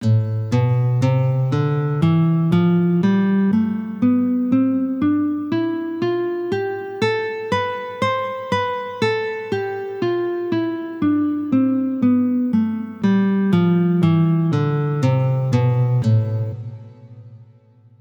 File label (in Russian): Gamma Lya minor